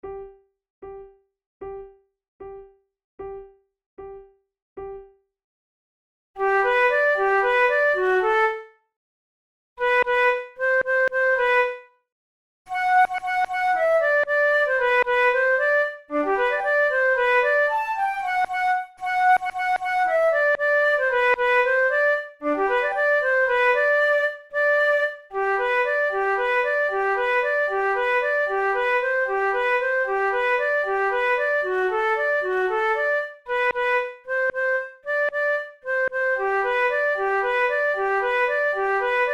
duet, flute

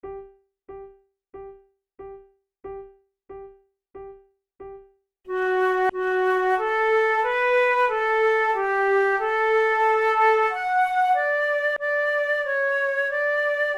duet

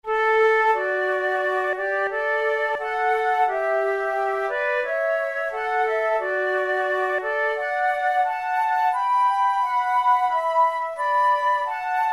duet, flute; auld lang syne

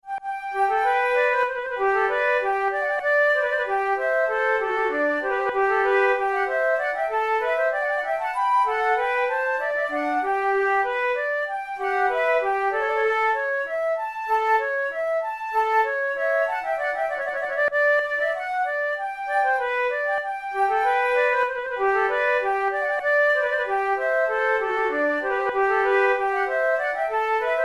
for two flutes allemande; Monteclair; 6 concerts; flute tunes

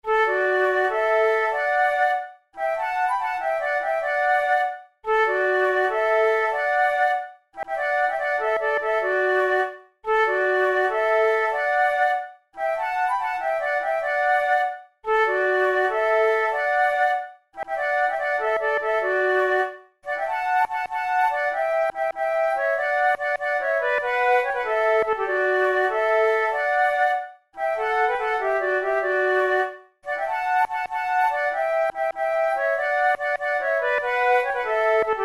duet, flute

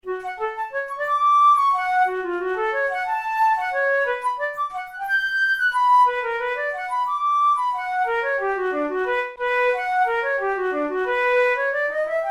study in f sharp minor, flute; kohler op93 no2 study no10

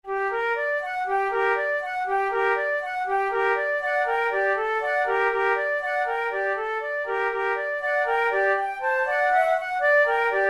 vivace, flute tunes; loeillet de gant op5 no10 i

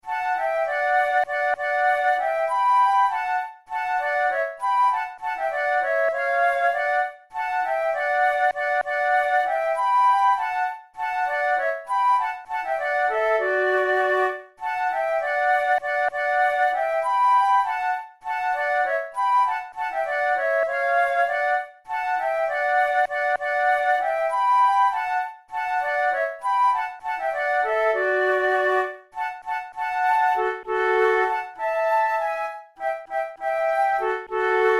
duet, flute

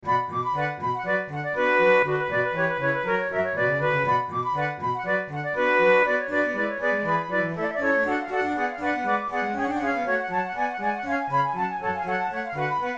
badinerie quartet, flute

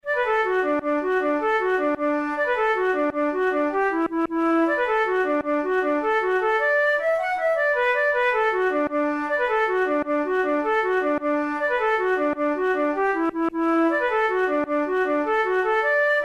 jig, flute

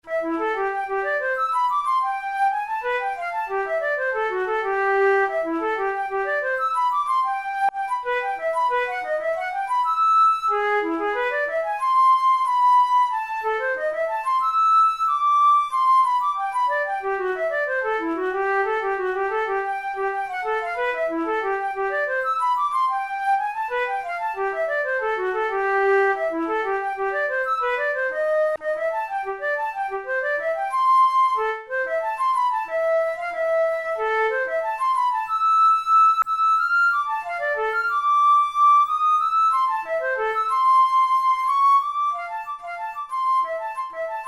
study in c major, flute tunes

